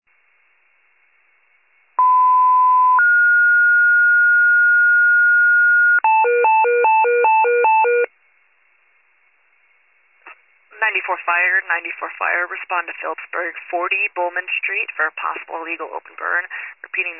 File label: FIRE pager alert